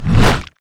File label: basicattack; LoL; trundle